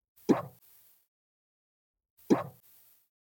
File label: vehicle hold